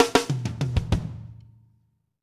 Fills; AMG; Now CD-ROM (Roland)

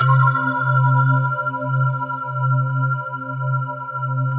SYN K-2000, Roland

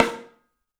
MARCH, 3D Drums; 3D Sound; MARCH XTREM

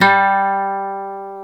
GTR nylon

